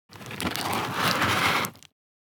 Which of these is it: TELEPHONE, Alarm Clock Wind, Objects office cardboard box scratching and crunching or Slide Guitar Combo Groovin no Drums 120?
Objects office cardboard box scratching and crunching